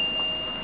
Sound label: Ding; McPoet 3.0